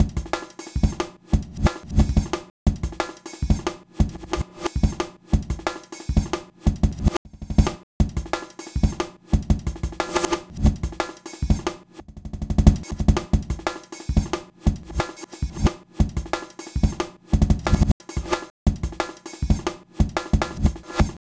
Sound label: Beat, Drums, Eclectic Electronica